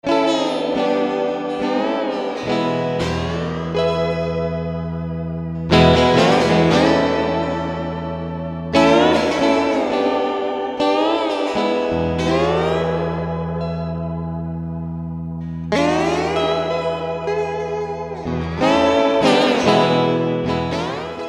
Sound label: open e, Alt Tunings